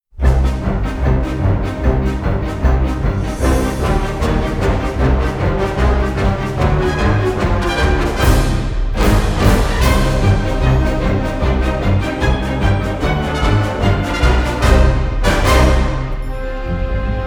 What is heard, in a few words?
Night at the Mausoleum (No Choir), Themes for Orchestra